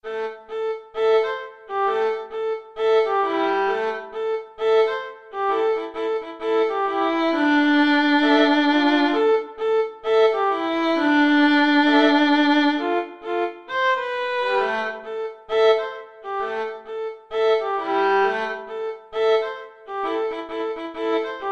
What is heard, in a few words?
hip hop house